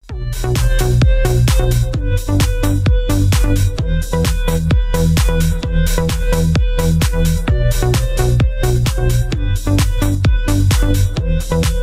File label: instrumental